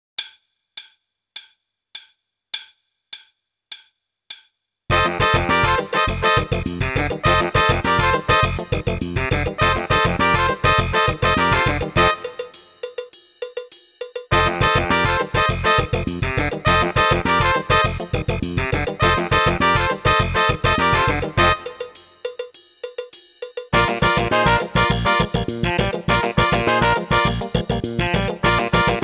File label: Funk; Meespeel CD